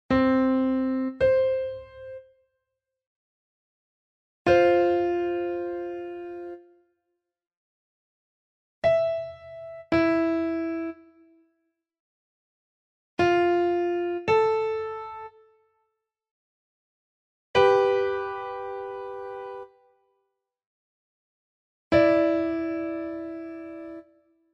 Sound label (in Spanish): intervalos